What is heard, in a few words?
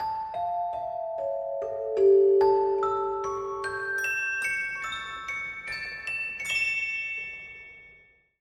Mallets D Major; Mallets of Revelation